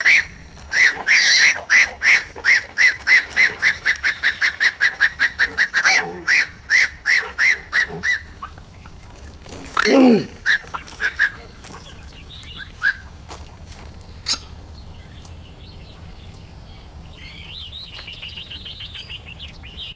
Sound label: coypus squeaking and rustling outdoors; animals